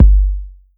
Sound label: Kick, 909